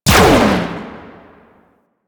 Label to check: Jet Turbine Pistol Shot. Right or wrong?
Right